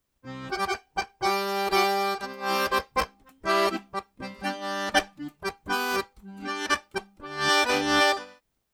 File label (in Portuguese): Acordeon Arrocha Simplificado